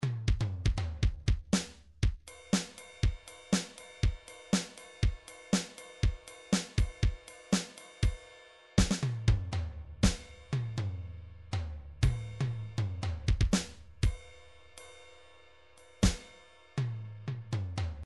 drums rock, midi drums

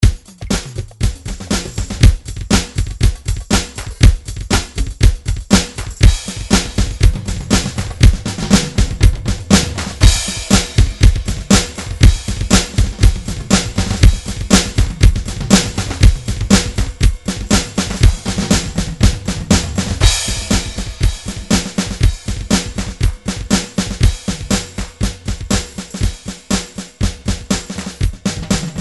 drum grooves midi loops; Nice Beats 1000

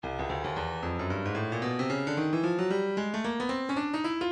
stylus breakbeat midi files 112 bpm; Bobb A

